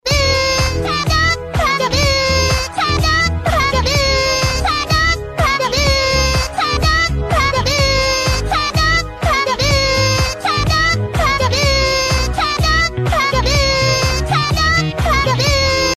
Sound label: padoru